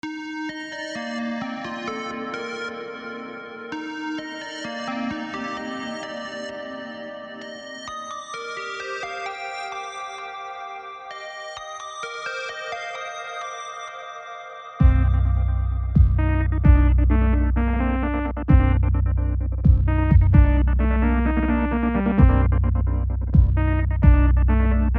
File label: whale lights